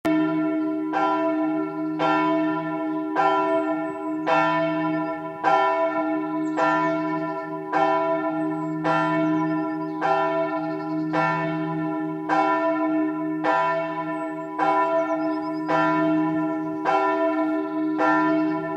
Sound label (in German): Mittlere Glocke